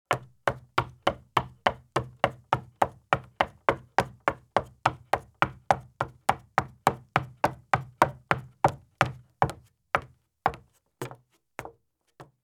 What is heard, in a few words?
Women's Thin High Heel Shoes Run on Wood Porch or Footbridge; Sound Ideas; Footstep Sound Effects